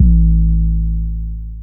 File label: 808 Bass A min; 808s